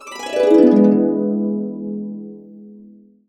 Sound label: E, Harps; USB Soundscan vol.62 - Complete Harps; AKAI